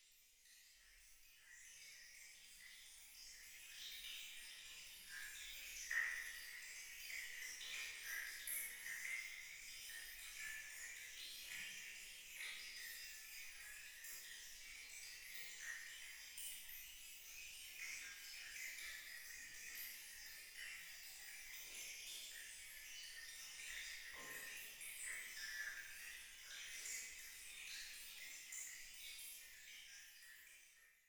water

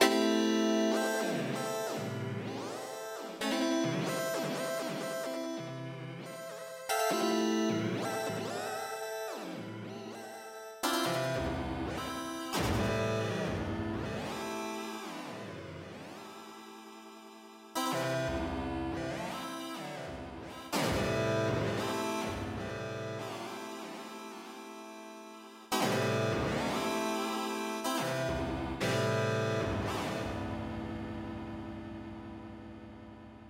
harpsichord

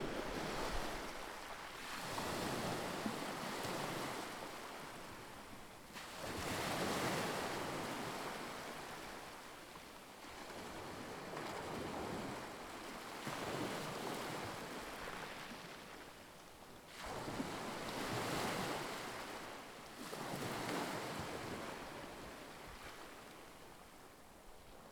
waves

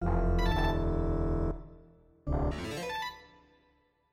ensemble

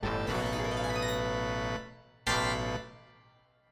ensemble

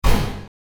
knock

